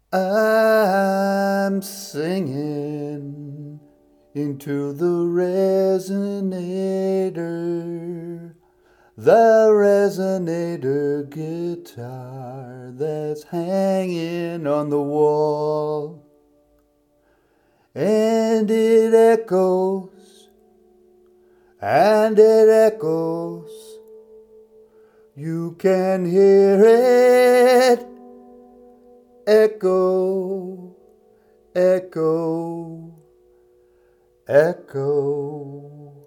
resonator echo sing

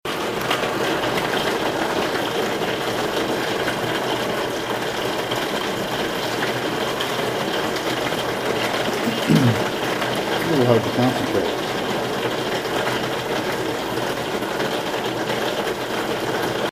rain

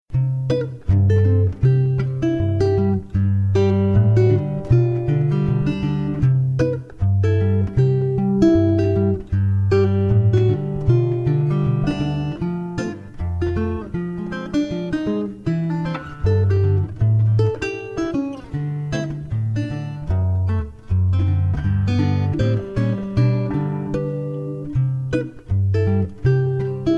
Resonator Sauntering

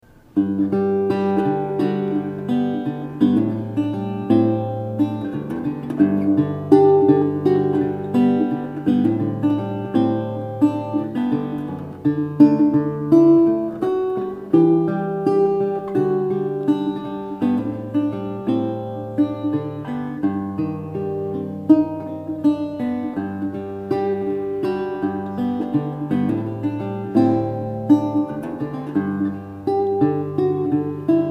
resonator sketch

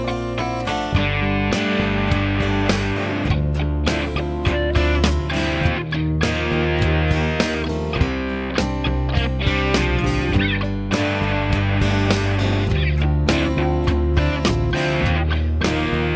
folkslinger library hard

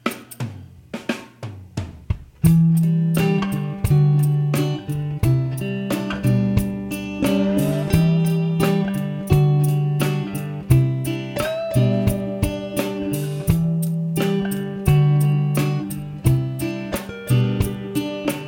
resonator

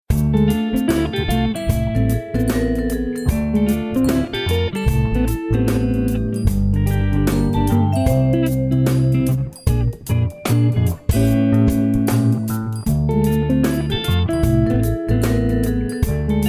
jazz

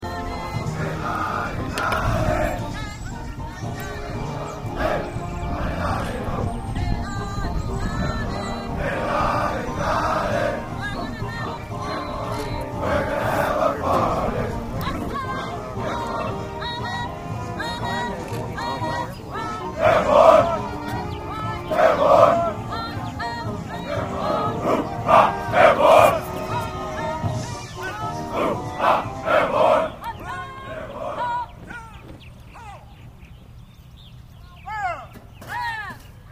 military

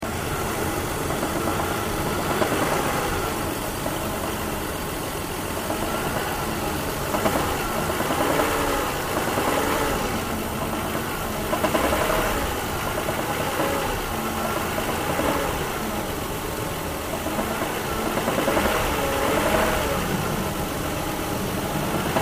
engine hood up

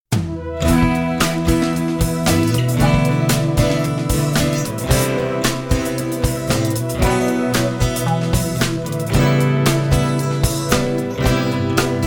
library funk

